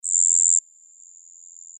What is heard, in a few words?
japanese crickets isolated single